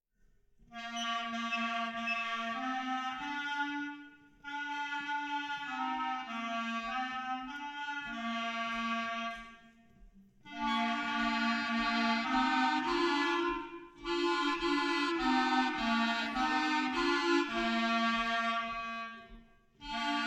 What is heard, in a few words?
Clarinets Itsy Bitsy Spider